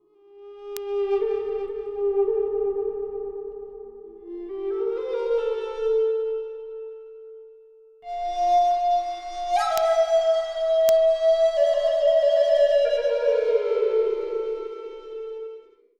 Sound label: ETHEREAL TEXTURES; Sonic Foundry (Sony Creative Software) - Future Retro Dance Excursions